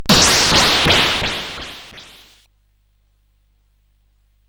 Tecnetium, CSG8580R5

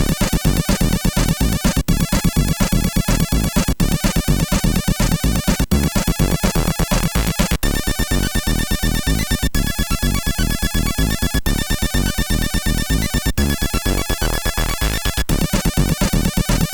Intro, MOS6581R4

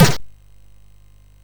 sid MOS6581R4; Tecnetium

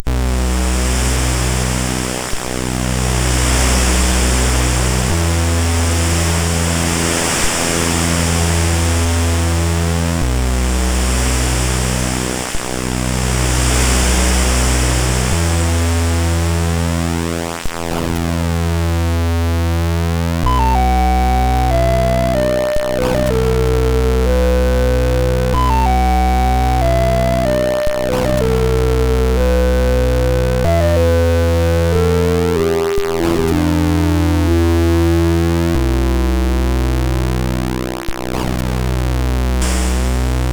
tune, MOS6581R3

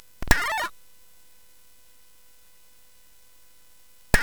Sound Fx, CSG8580; hvsc

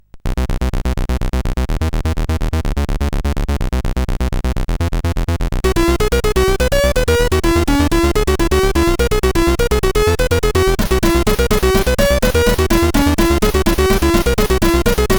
Loader Tune, CSG8580R5

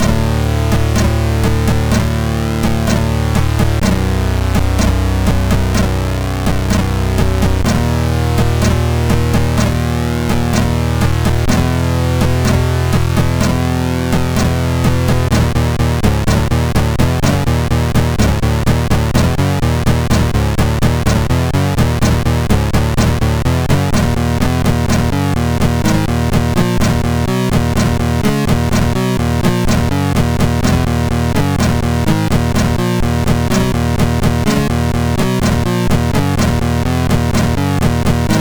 Hard n Heavy sid, MOS6581R2; Zool

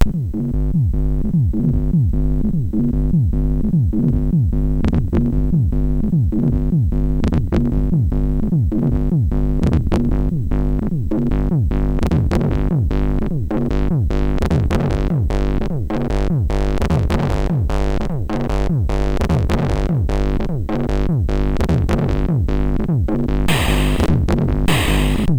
Cliche Beat, MOS6581R4; hvsc

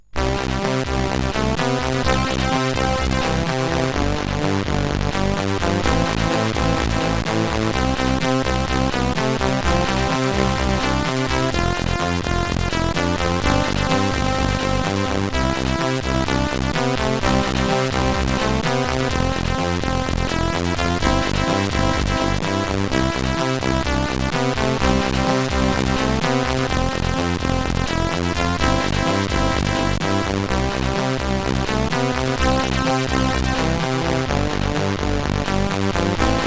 Noisemaster, CSG8580R5

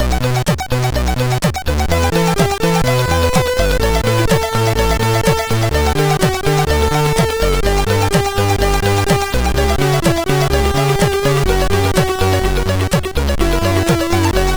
Intro, MOS6581R4

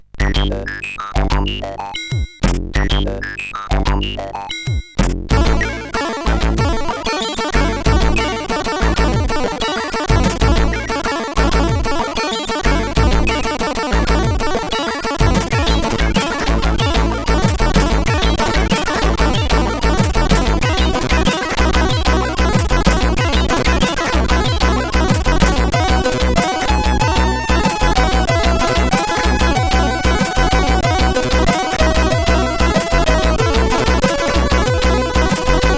Chill, sid CSG8580R5

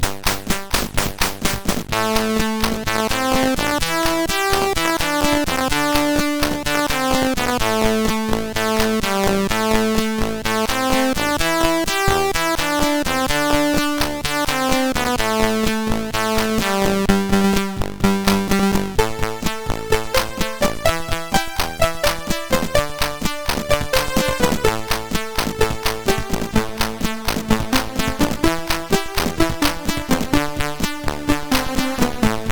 Zound Muzak intro, sid CSG8580R5

